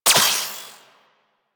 Guandao Pistol Shot; Warframe; Tenno

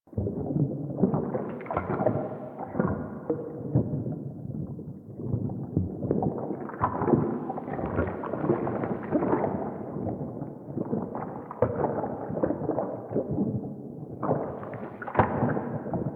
Blood Through Veins Loop; Warframe; Sacrifice quest

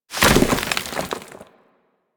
Animal Despawn Dirt Dive; Warframe; Hunting Critters; Foley

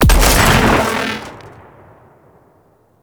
Reinforce Beacon Death Explosion; Warframe; Venus; Reinforcement Beacon

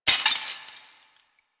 desktop glass destroy; ambience; Warframe; Entrati

